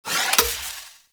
Shotgun Fire Stop Mech; Warframe; Tenno; Alchemist

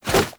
Arm Swing Foley; Warframe; Purgatory Warrior

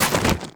Deployable Cover Destroy; Gameplay; Warframe; Tau; Destructible Dynamic

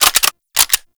Lever Action Rifle Reload A; Warframe; Tenno

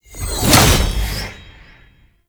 Orbital Strike Cast Plant; Enemies; Abilities; Warframe; Tau; Dax Heavy

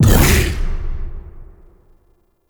Bowl Jump Second C; Warframe; Grendel

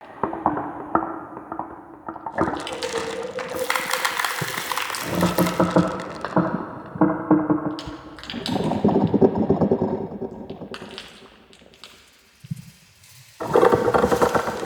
Wevetwood Amb Loop

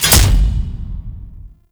Attack Impacts; Gameplay; Golden Maw; Warframe; Ambience; The War Within

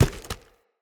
Tree Dead Small Destroy Chunk

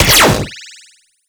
Fire; Warframe; Weapons; Spectra

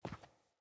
Grunt Boot Walk Default, Footsteps, Boots; Enemy; Sinecure; Melee Grunt

